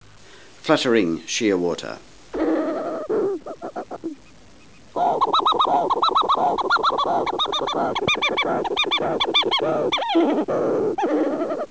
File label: Fluttering Shearwater